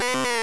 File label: boss pain; Super Mario Land